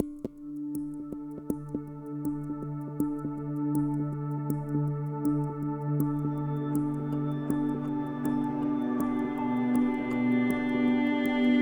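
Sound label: Music Orchestral, Orchestral Post Race 80bpm; Forza Motorsport 6 Apex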